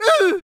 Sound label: ooh Loud; HeavenStudioPlus; djSchool